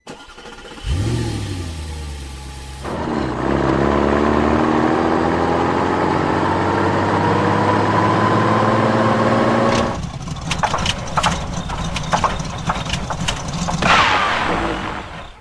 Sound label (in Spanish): carro falla; ze mcbo bridge